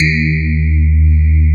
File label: SPARKLES; Wizoo - Powered DX